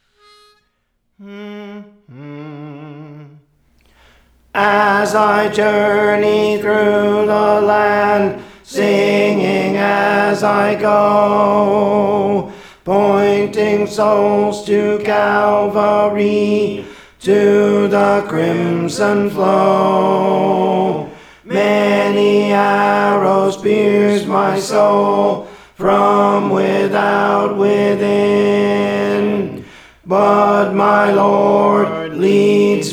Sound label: Tenor, Songs; Praise For The Lord Training